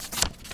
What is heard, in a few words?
slide sound